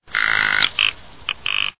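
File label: rock ptarmigan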